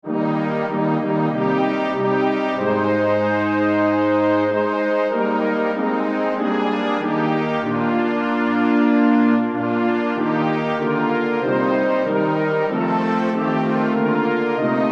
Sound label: Brass ensemble